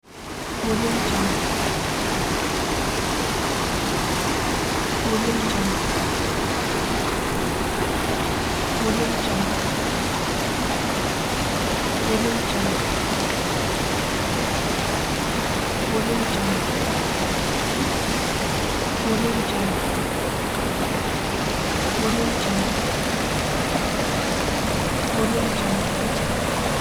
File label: waterfall